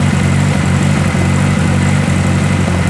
Vaz Niva Idle

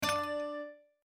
Chip Destroying; my cute commissar 2020 gamerip

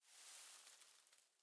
Hay Movement; The Town

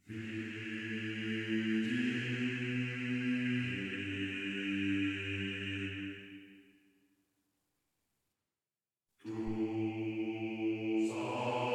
church male choir; gamerip; Identity V

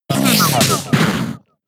Lick; pokemon sfx gen 6 attack moves xy oras

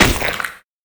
Meat Explosion

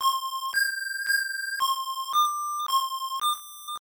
Ocarina Melody

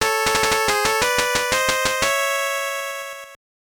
Jingle; Taiyou no Tenshi Marlowe Ohanabatake wa Dai Panic; GB

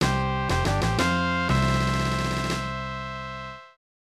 Unused Jingle; Gambler Jiko Chuushinha 2 Dorapon Quest; SNES

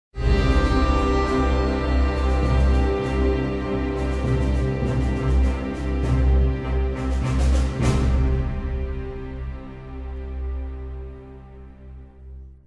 Sting; Unused Sting For Hostage; Call of Duty 3; PS2; PS3; Wii; Xbox; Xbox 360

